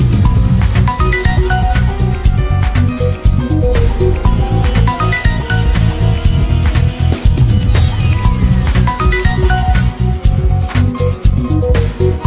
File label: Samsung S10e Ringtone